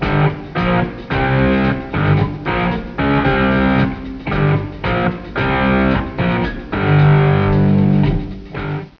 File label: riff